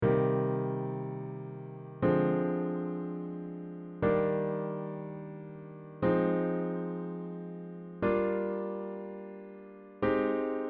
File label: C Nm Chord